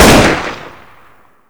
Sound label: new ak47; garrysmod